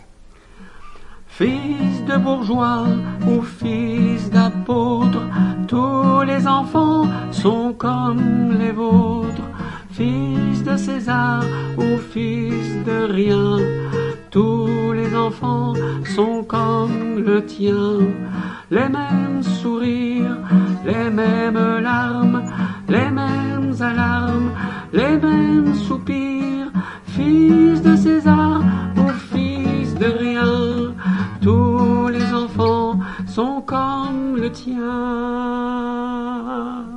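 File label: guitar sopranes, chorale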